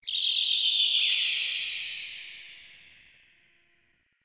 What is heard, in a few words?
Forest Distant Bird, Ambience; Warframe; Grineer